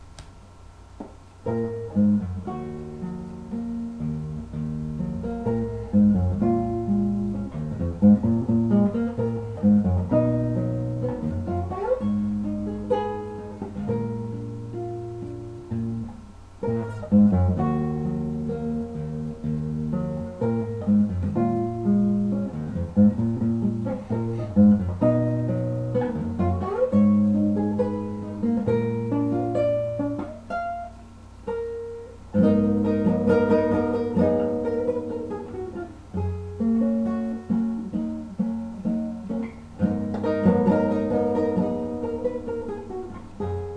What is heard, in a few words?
Sad Melody